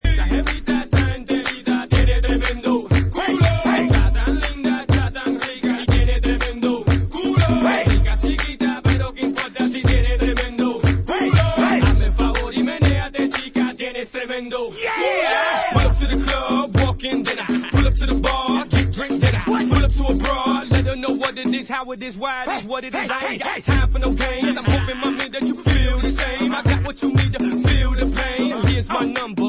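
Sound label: reggaeton, ringtones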